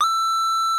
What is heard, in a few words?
psg guitar